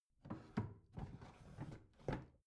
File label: bathroom drawer open soft